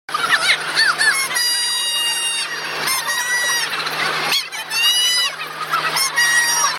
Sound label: pizz